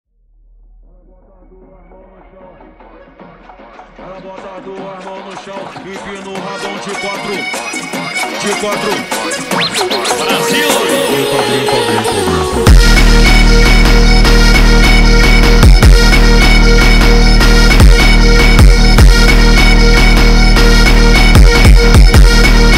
Phonk

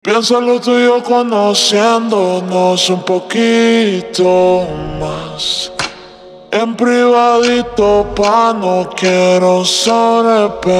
Phonk MONTAGEM Ultra Slowed

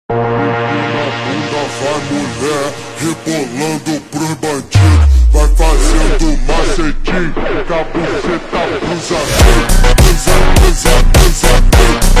Phonk Super Slowed

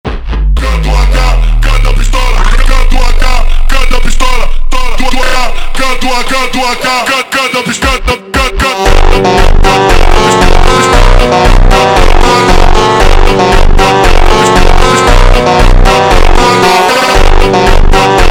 Phonk MONTAGEM Slowed

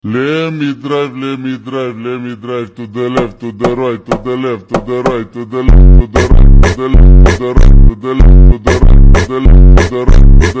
Phonk Super Slowed

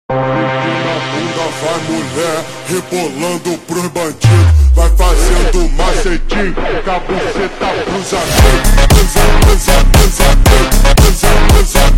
Phonk Slowed

